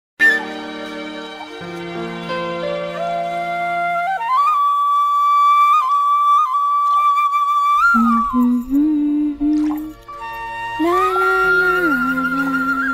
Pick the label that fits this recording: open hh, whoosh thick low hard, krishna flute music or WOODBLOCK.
krishna flute music